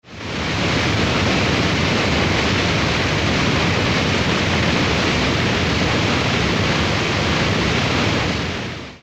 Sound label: Cascading Waterfall; Tropical Rainforest